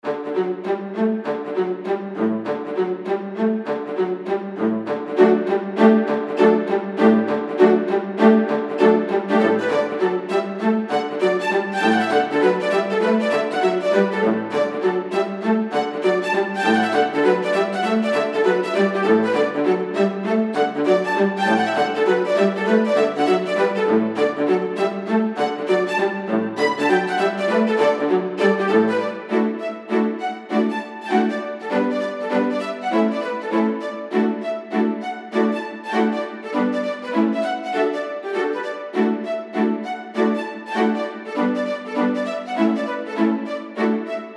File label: String Quartet; Super Mario Galaxy 2